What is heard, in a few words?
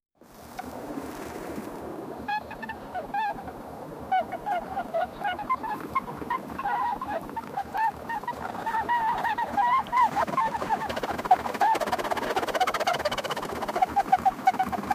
on an ice river galloping, clip clop